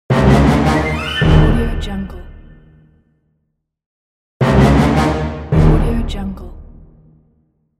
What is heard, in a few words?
you lose